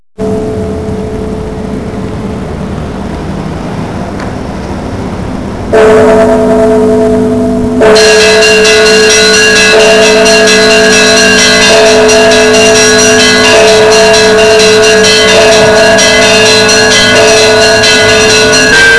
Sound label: bells; HTC